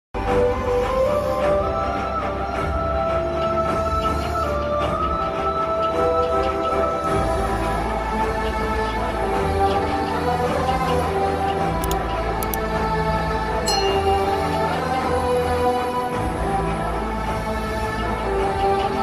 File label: heart touching bgm sad emotional marriage band